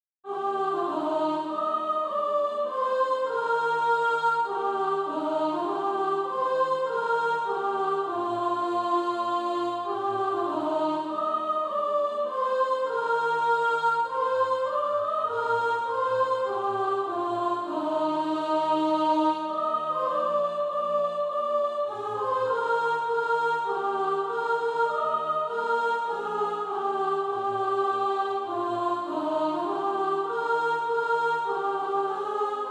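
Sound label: soprano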